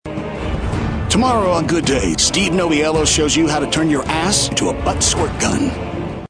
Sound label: BUTT SQUIRT GUN